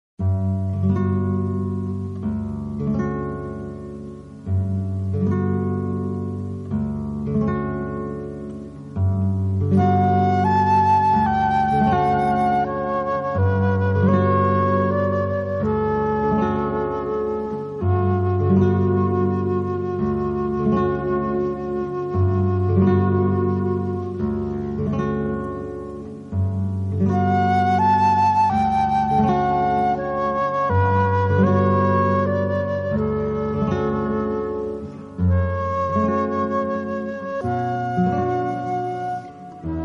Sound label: duo flute guitar; satie gymnopedie